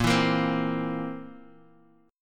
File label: Bb Suspended 2nd Flat 5th, Guitar; Bbsus2b5 strummed; 6,7,8,5,x,x; JGuitar